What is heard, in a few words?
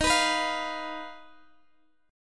D sharp Suspended 2nd Sharp 5th D sharp Guitar DADGAD